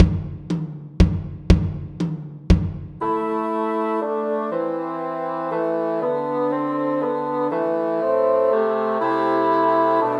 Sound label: We Three Kings Christmas Trios for Three Bassoons